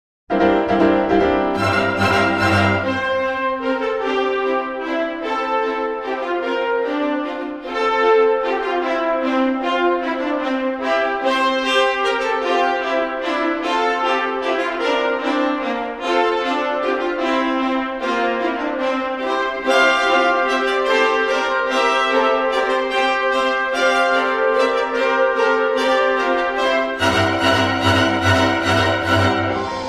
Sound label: Concerto for piano and chamber orchestra, Fast, Brilliant